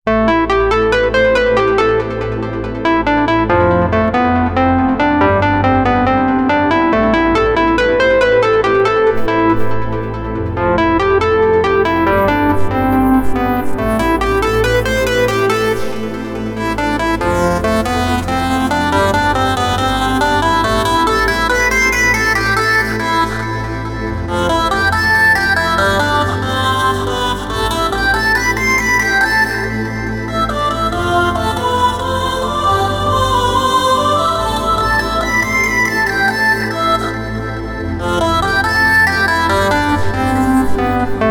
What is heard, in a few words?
filter loopmorph; prosoniq morph vst